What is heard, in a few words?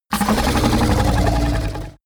fishman snort gargle aggressive; kreaturen